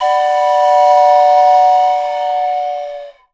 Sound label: train whistle; Voice Crystal - Steve Reid Definitive Percussion; ASRT WHISTLE